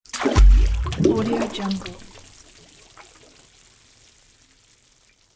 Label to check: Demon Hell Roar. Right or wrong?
Wrong